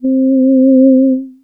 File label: Casio CZ-5000 Human Voice C4